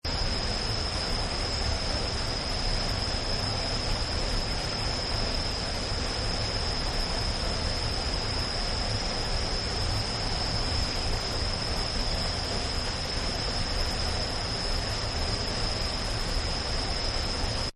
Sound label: Jungle Night Waterfall; Nature Scenes; Forests and Jungles